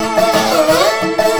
dobro danger

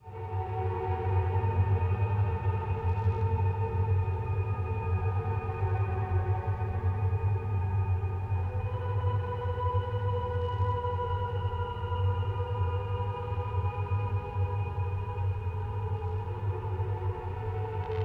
Forest Drone

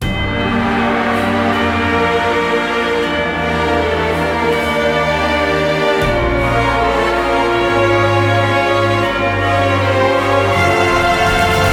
Battle; RPG Battle2 ImperialSide In; Granblue Fantasy Versus

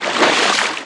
Footstep water Heavy

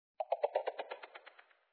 end Wave; Bubble Tanks Tower Defense

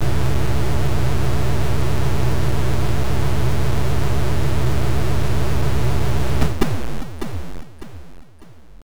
Door Open; Dragon Slayer II Xanadu; MSX

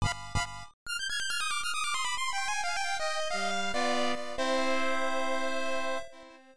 Failure; Kakyusei; PC-9801

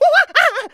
Monkey Near Falling; angry birds rio

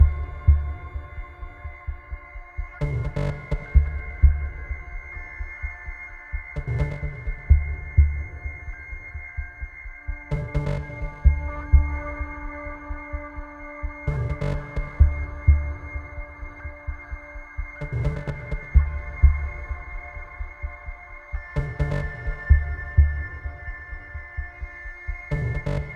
Music Epic Hits City Fight; Battlefield 4

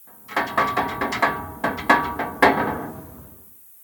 Metal Bars Random; The Town

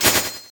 sword

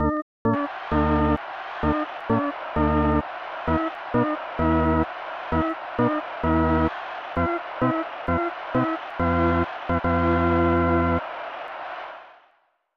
Stadium Organ; snes; Brett Hull Hockey

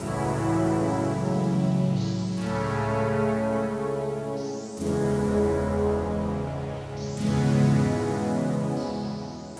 Lead Pad Layer

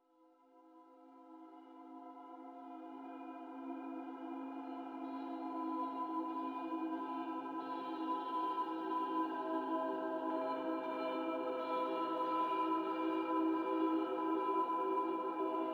environment temple night chimes

